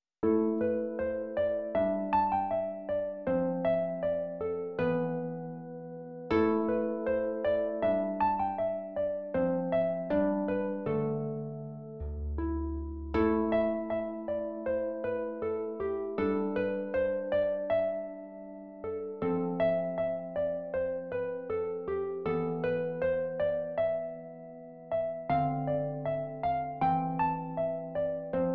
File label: Carols for Solo Harp